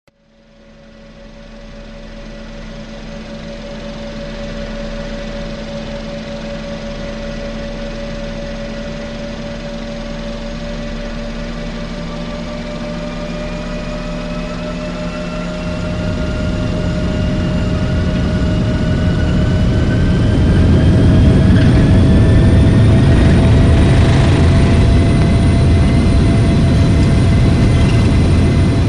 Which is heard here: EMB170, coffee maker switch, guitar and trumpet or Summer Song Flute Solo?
EMB170